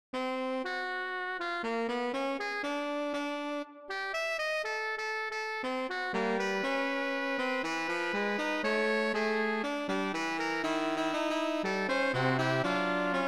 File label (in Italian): Fuga